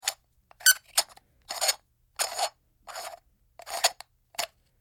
Canister Foley Squeaky Open Close; Squeaks (Sound Effects:Objects)

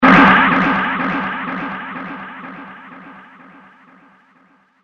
Constant Impact; Miscellaneous Impact Sound Effects

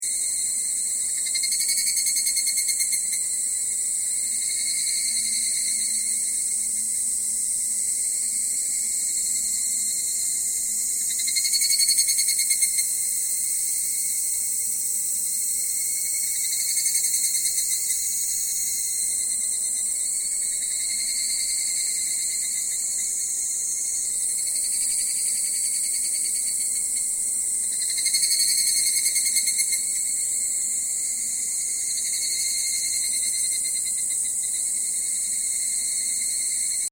Insect Takao Japanese Cicadas Summer Loop

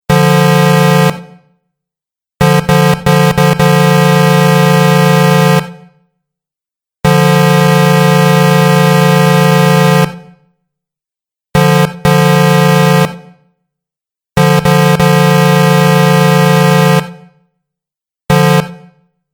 Truck Horn Selection Pack; Truck Sound Effects

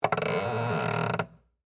Creak Plastic, Texture, Creak, Plastic; Creaking; Objects; BlastWave

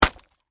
Flesh Chop Impact; Horror; Blood and Guts (Sound Effects:Horror)

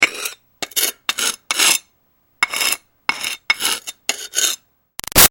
Foley Rock Scraping Trowel Archeaology; Materials

